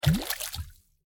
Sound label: Fill Water; Granny 3; Android